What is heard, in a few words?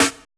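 lite snare; Drums In Your FACE!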